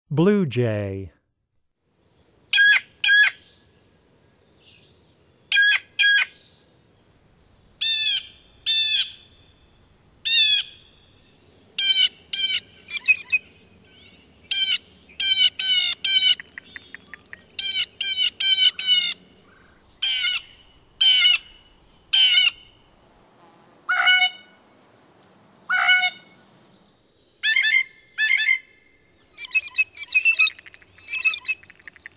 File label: Blue Jay, Wildlife Sounds